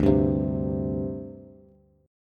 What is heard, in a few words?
D 7th Suspended 4th D Bass; strummed; JGuitar